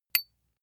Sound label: small metal impact medium high; industrie wirtschaft; metall